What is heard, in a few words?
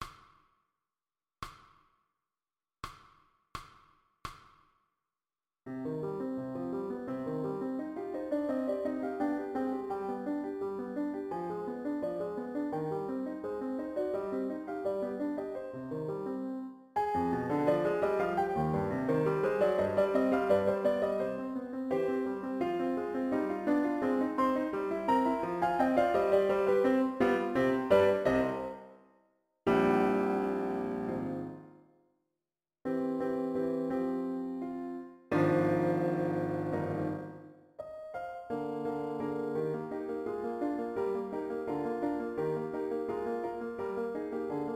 Pathetique Sonata Flute and Piano backing full; 3rd Movement; Beethoven